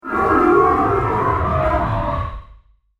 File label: Landing Transition Element, spacecraft sound effect; science fiction sound effect